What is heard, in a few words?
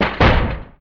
building miss